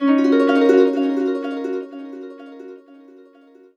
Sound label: 127 Ebmin7 no root Harp